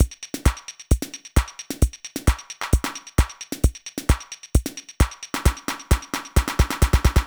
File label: Beat, Old Skool House, 132bpm